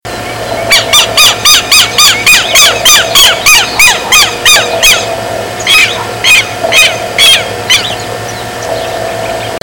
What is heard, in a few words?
Vanellus chilensis - Tero